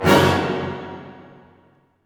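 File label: orchestra hit major; String Master Series; Dynamic Orch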